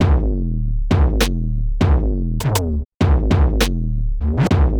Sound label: DRUM2 WET